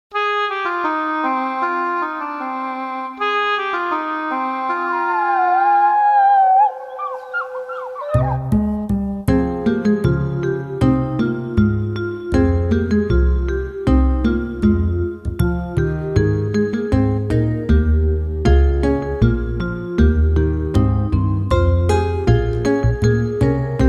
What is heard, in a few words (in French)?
Moyen Age Instrumental; J ai vu le loup l renard